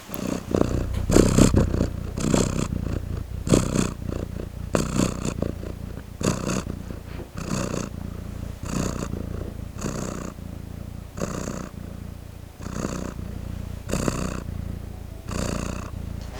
Cat Purr

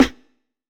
Dembow

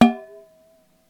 Pot

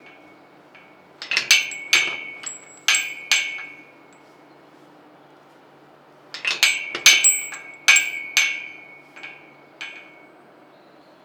Chain Rattling On Fence

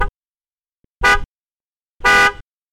Car horn

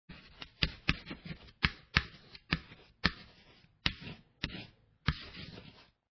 Chalk Writing On Board

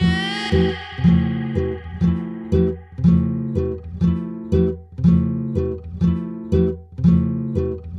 Guitar

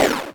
Synthetic Laser

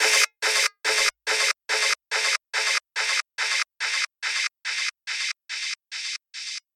Elves Breath Delay Synth Effect Sound

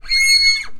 Whistle

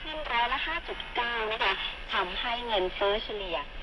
Asia Vox

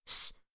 Shush

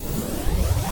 Sci Fi Sweep

